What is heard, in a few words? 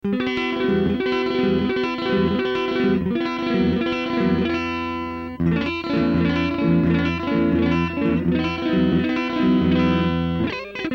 CLEAN